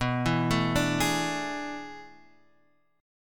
A Major 7th Suspended 2nd Suspended 4th

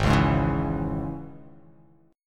Ab Suspended 4th Sharp 5th, Absus4#5 chord; strummed; JGuitar

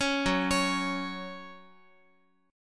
Gb 5th Gb down Guitar; Gb5 arpeggiated; JGuitar

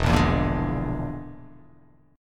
E 7th Flat 5th, E7b5 chord; strummed

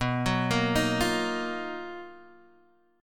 B Major Sharp 11th, B, down, Guitar Standard; arpeggiated; JGuitar

